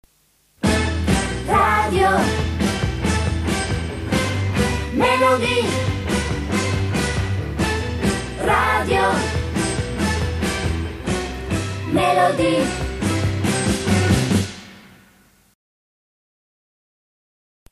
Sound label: radio melody